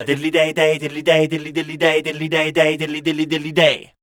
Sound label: SCAT #D